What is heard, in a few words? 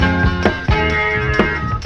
guitar, Loops, Phonky